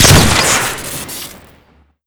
shoot crit; weapons; tf2classic

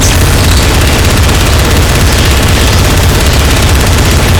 gunnercannon fire crit; tf2classic

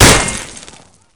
sword hit world; tf2classic

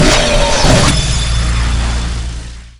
tokamak wind down; tf2classic

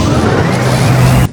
charge up, weapons; tf2classic

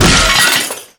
robo impact hard; tf2classic; mvm

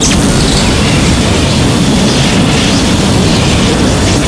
afterburner loop crit; tf2classic

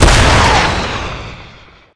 burster shoot; tf2classic

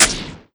knife hit; weapons; tf2classic

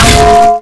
melee frying pan; weapons; tf2classic

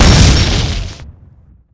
dispenser explode; weapons; dispenserizer; tf2classic

